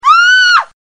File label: scream female